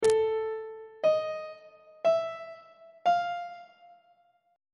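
a diminished 5th perfect 5th augmented 5th intervals; treble clef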